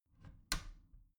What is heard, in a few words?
bathroom cabinet warped close soft; objekte; tueren